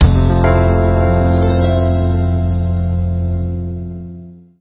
Stinger Romance Complete; android

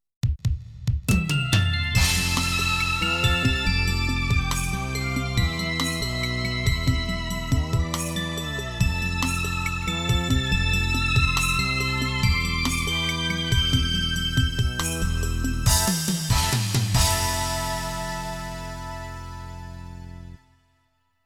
Ending - Sad; Aero Fighters 2; Sonic Wings 2; arcade

